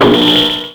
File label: shield in; WhiteOut